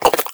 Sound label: breath in, headcrab